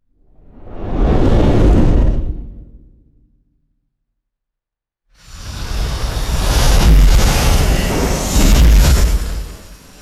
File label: designed tubular whooshes and explosive bursts